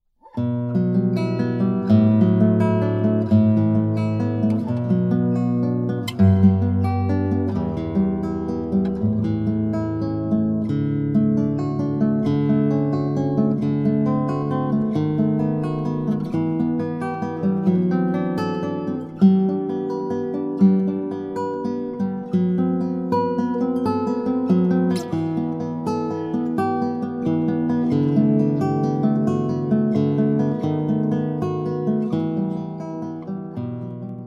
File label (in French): Mouvement perpetuell Guitare